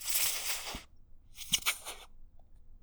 Plop - Wheeze, bottle plops

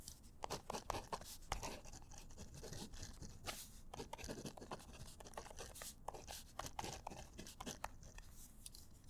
Pen Writing; Books, Paper, Writing

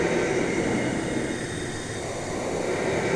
ambient; 30 cc0 sfx loops

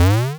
jump big quick, square waveform; Square Waveform sfx

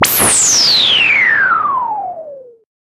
Noise Sweep Down; FREE SFX PACK VOL 3

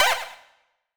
menu; Free SFX for anything

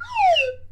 Fast Drop, Slide Whistle

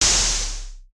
retro explosion; sci-fi sfx

